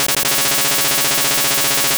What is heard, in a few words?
Aurora Beam; attack moves; pokemon; gen 2; gsc